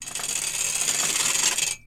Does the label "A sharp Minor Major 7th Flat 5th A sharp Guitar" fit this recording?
No